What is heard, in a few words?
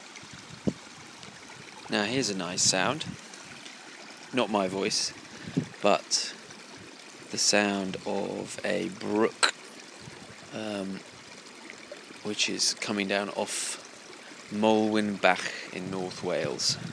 babbling and brooks